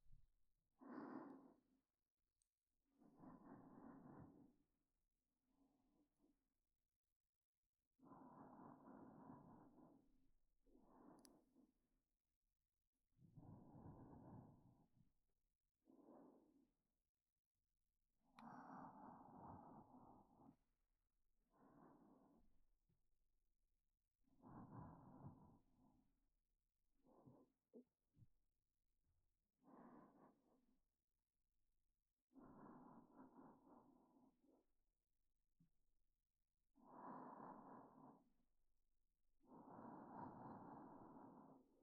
寝息ループ; 立花

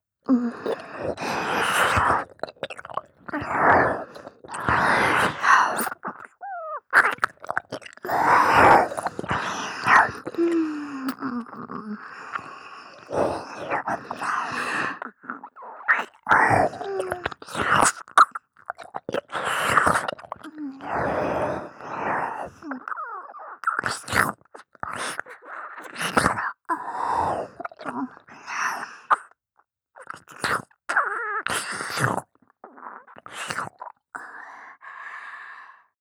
耳舐め（激しめ）

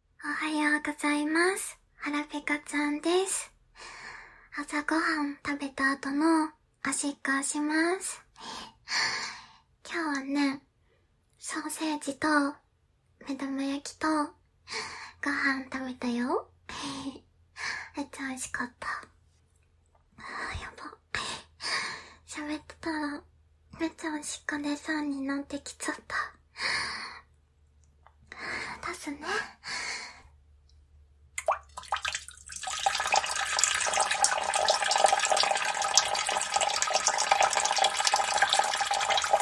おトイレで 朝ご飯の後の オシッコですッ ちょっぴりオナラ

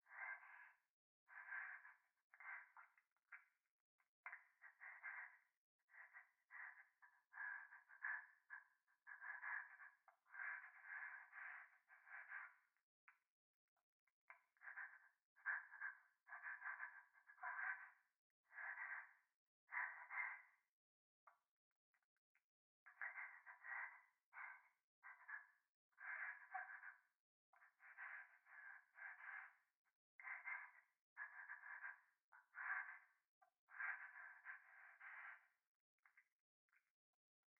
風呂 右耳快感吐息, ASMR

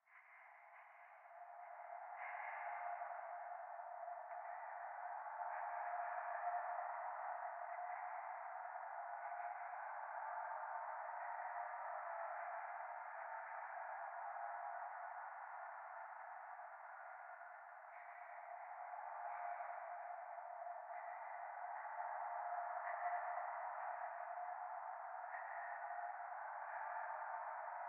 左耳快感吐息 車の中

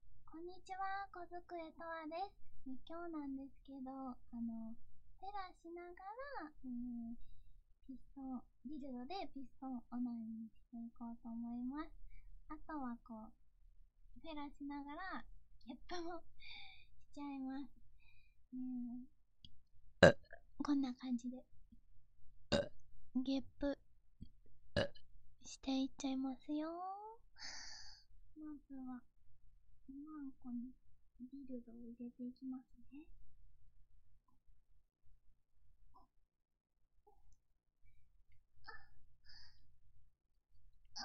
【オホ声ゲップ】ディルドフェラしながらガチオホゲップピストンオナニー

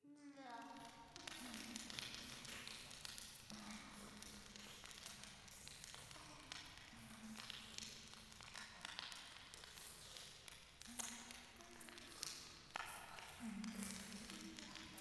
フェラチオ 暖炉

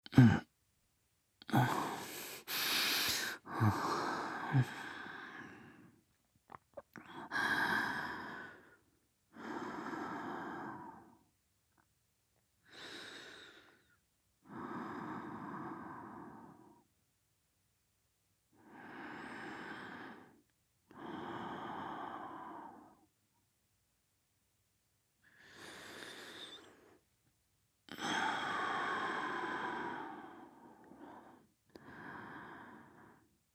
寝息ボイス

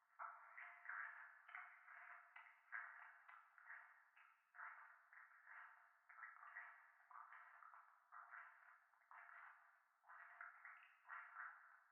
教会 フェラチオ

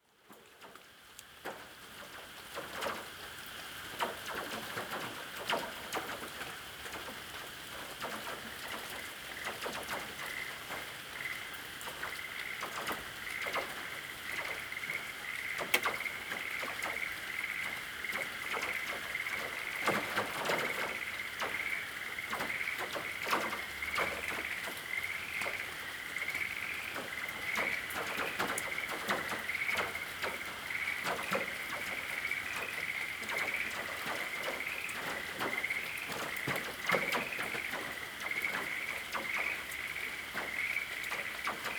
神社 御手洗い; 荒立神社

